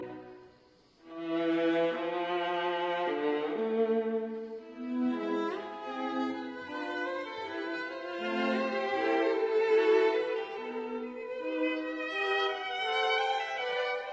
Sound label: String Quartet in E minor Allegro moderato; Faure; Op 121